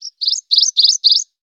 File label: Bird Call; moul